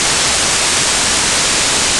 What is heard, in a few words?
whitenoise; akustikfort